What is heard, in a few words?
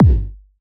kick verb